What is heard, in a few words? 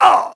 HIT; battlesnds